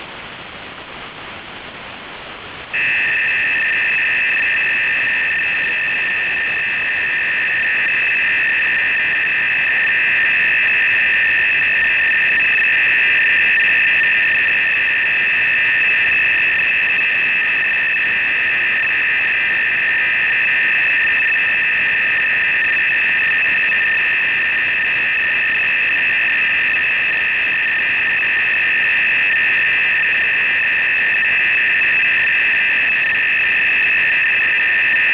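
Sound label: usb fsk-2 800 Hz 400 baud; 12423khz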